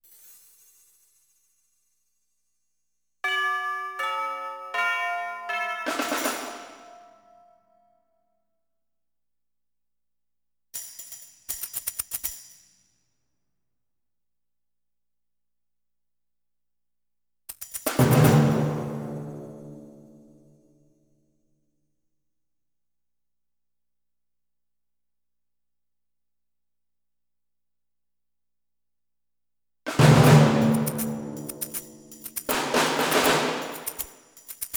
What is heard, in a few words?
percussion only